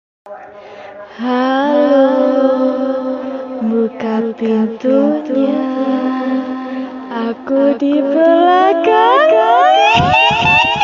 nada dering horor lucu

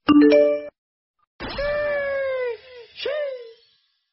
nada notifikasi Wa Emot Sheesh; WhatsApp

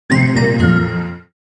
nada notifikasi gopay

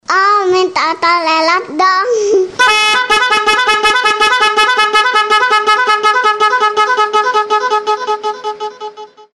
nada dering om telolet om

